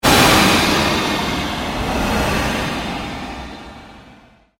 Created Pulse Long Sweep, Sweeper Sound Effects

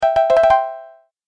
Message Alert Sound, musical; Twistedtracks

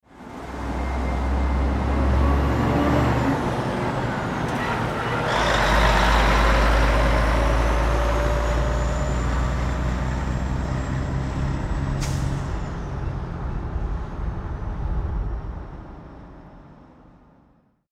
Truck Drive By Diesel Engine; Trucks; Vehicles; Industry

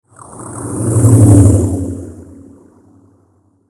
Spaceship Pass By, Small, Ship, Science Fiction

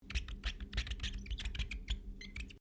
GLASS SQUEAK RUBBER SEQUENCE; Material components for constructing more complex sounds

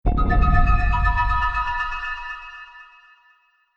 Fantasy Magical Hit; Magic Sound Effects

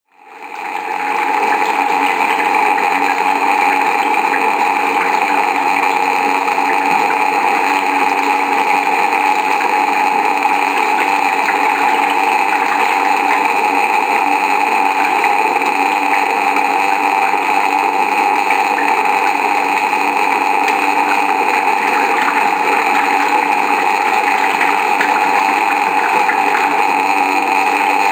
Horror Drone Water And Static; Scary Soundscape; Andrew Fleming

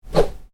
Swish small, Swish: Short, Light; Whooshes; Sound Design; Stuart Duffield

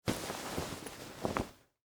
Winter Coat Unfold, Clothes, Over, Arm; Foley; Dressing Sound Effects; SoundEffects-BlastWave

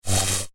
Laser Shield Wall, science fiction sound effect

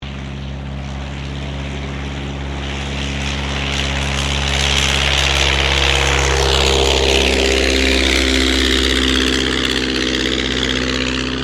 AIRCRAFT DH82A DEHAVILAND TIGERMOTH TAKEOFF; RAAF Tigermoth; Airplanes

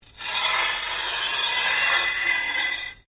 Blade Lock Scrape, Sword

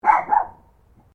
Animal Dog Chihuahua Bark Double; Newcastle; Various domestic dogs; Dog Sound Effects; Dogs (Sound Effects:Animals); Foundation1

